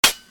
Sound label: clipout; janus3; weapons